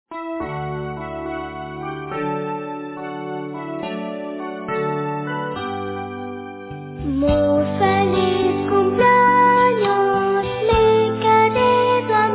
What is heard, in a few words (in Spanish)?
Muy feliz cumpleaños, Cantos Infantiles; Escuela Sabatica; Niños; Jardin de Infantes